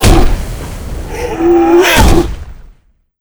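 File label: boss death; Wirinom; lava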